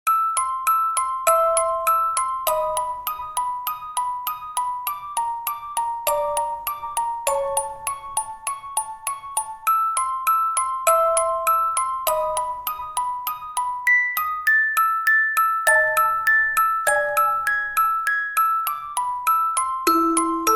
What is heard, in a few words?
Ghost House Night; SMM2; SMB3